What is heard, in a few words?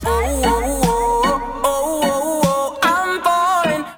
whoop